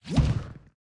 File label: boss birth; mergemilitary; Epic Toon FX 1